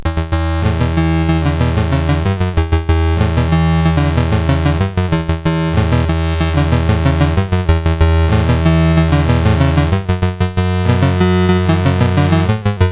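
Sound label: Magicians Ball, MOS 8580 SID chip music, games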